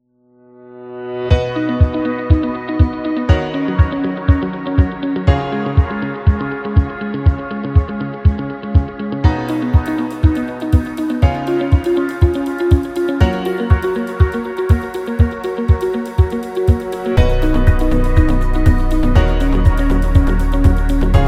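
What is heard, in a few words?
Healthcare, motivational corporate music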